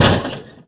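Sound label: metal destruction, Impact; Cars; XBOX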